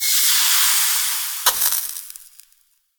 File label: burning powder; battle